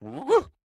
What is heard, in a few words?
Wind Up; Joblin